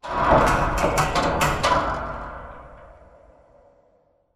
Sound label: metal stress med; Titanfall 2